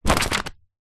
household telephone directory yellow pages drop down